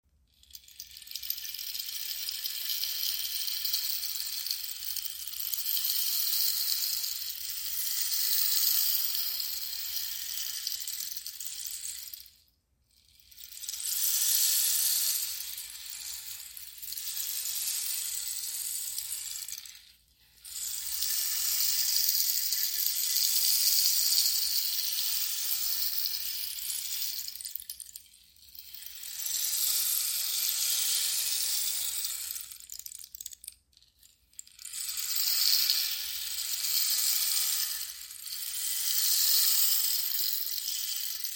Cactus rainstick

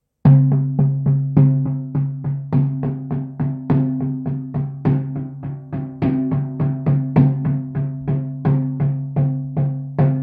frame drum

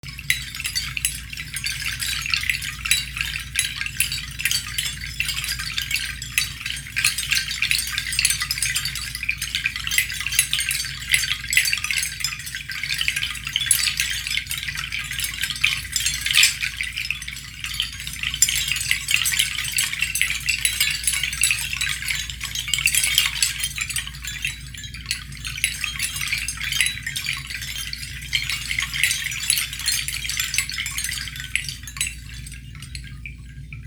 Mixed shell seed chime; natural shell seed wind chime handcrafted coastal decor from bali

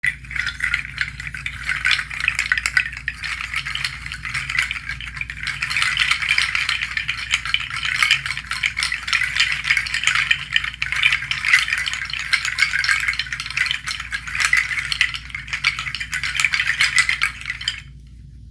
Pandi handheld, rain water sounds, seed shakers; pangi seed rattle handcrafted in bali

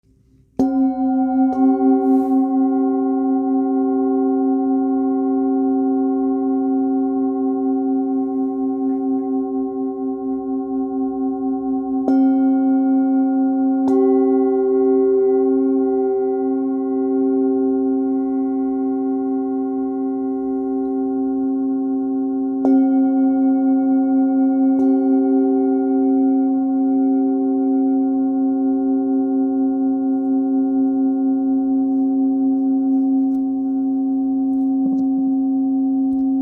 Challis C G, tibetan singing bowls; double chalice tibetan singing bowls

